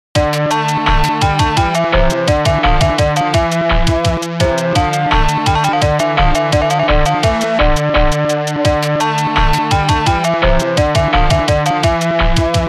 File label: Level Start; knightmare; ms-dos